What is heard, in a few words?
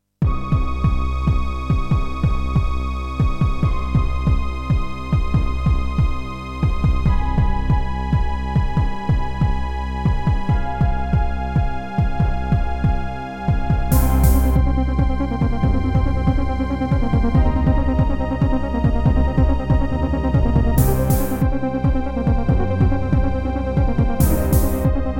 Serdaco McFly; sigil doom episode 5